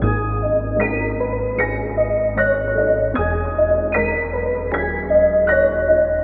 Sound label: piano, sad loop